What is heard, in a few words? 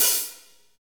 hat, Power Ballad; Northstar; Drumscapes Roland